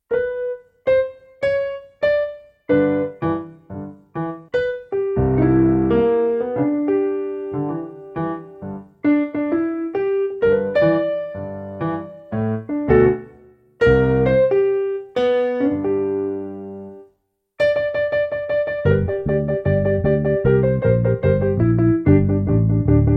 pc3 rock

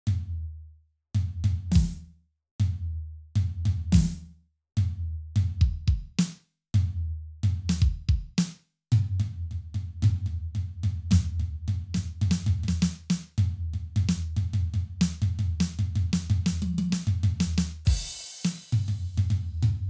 drums

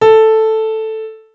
piano

